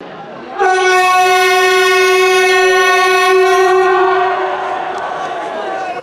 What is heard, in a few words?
loud buzzy stadium horn blast with crowd walla; vehicles